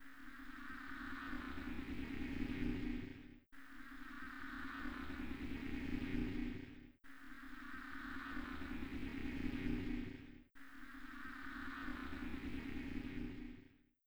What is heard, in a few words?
machinery